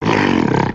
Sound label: rock throw; creatures organic